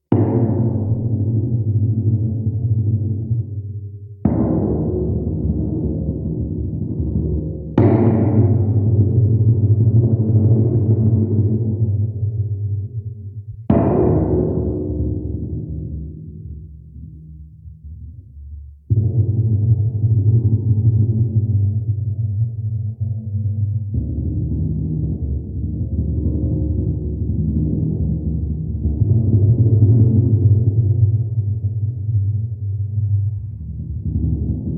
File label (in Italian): Timpani Statici